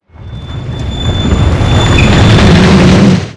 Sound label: sled; hl2 ep2; ambient, levels, outland